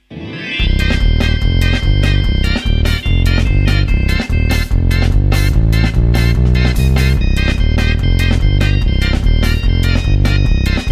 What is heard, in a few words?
VOX three tone; Arturia